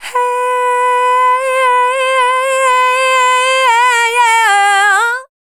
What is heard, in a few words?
Dance Vocals, FEMALE; Best Service ProSamples vol.12; AKAI